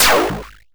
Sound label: exp shortest hard, Explosions